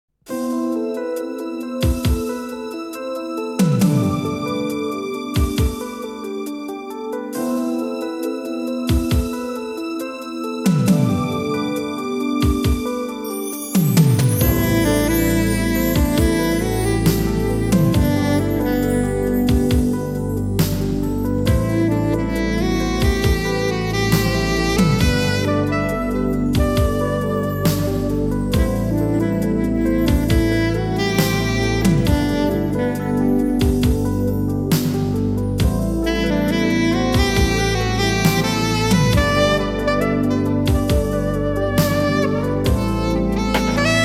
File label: romantic saxophone; hawaii